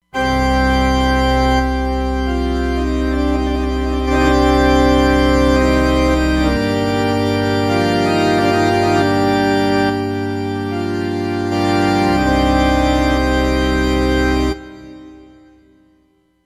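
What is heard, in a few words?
Pipe Organ Mod, Roland Fantom XR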